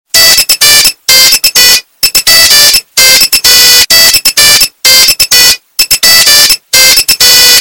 Nokia ringtone bass loud

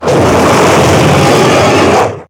roar; barioth

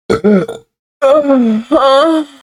powerful burp

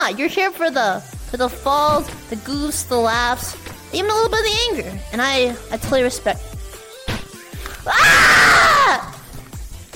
rage

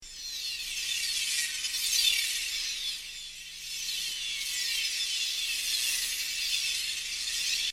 whizzing glissando

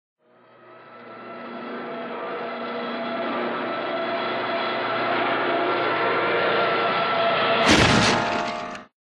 piano falling and crashing cartoony

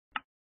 button click sound; minecraft aipha 1 2 6

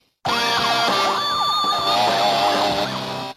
zombie enters

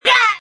hit; fallen

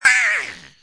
death; pigmy

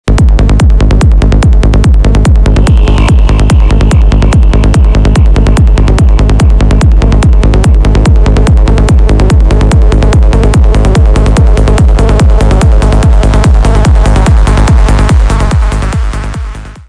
dance

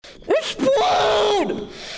explode

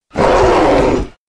roar; spino

